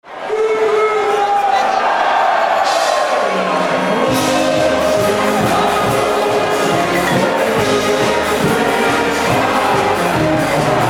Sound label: octoberfest prosit